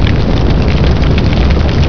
fire big

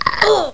fall; opentdm

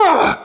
widow pain; rogue

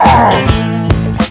pain; vortex; velvetjackson

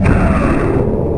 death

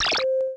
beep; zaero; world

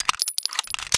load revolver; gunslinger

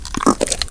flesh

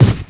bump; Lemming

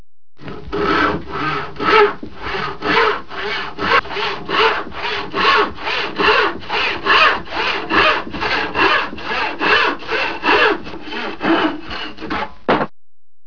handsaw

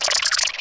spore neutral

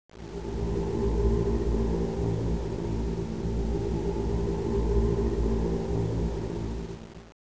chant; refuge

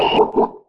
gurp; opentdm; DarthVader

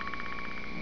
gurp; predator

